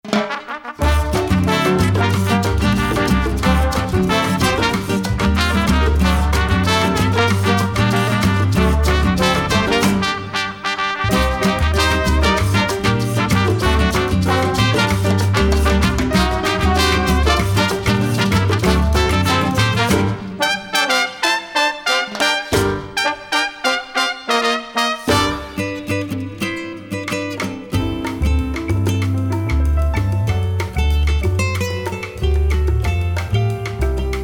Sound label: Urban Latin